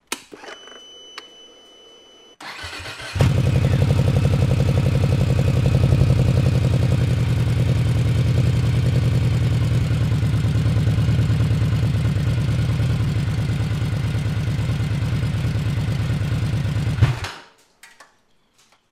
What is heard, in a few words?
startup, Vmax; 新型VMAX; 排気音; 始動